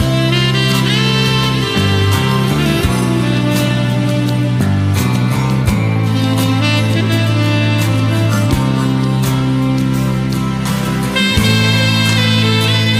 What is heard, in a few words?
sax goes wild